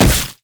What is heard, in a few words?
infected cleaver; mundo